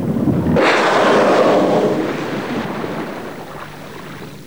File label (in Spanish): chorro aire